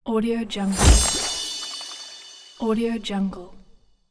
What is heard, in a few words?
magic chest